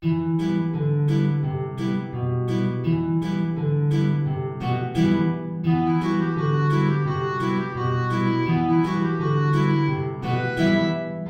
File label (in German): Klarinette in Bb & Gitarre (tief); Chiribim Chiribom